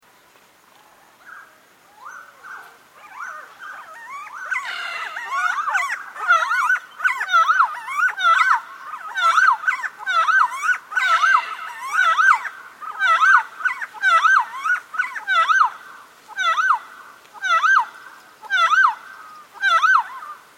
helmeted friarbird

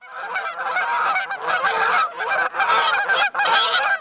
geese canadian flock

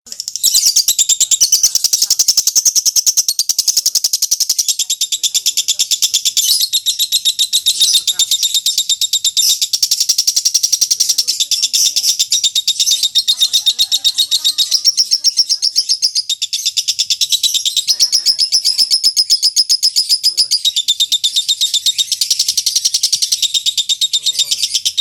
lovebird; fretty; om barnas

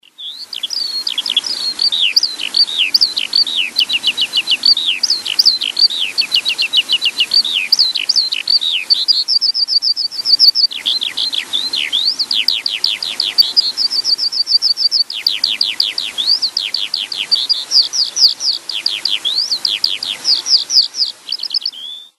skylark

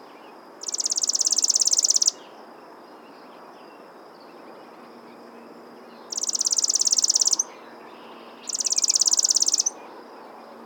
chipping sparrow

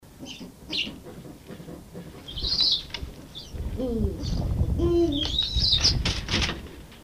bubo bubo

